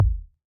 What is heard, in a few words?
Ball Collision Geo Tackleball Light